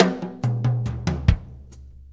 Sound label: 6-8 Tom Roll, Perc Loops